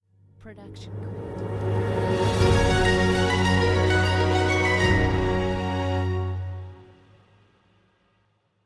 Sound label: News Ident Orchestral Fanfare; SoundsCrate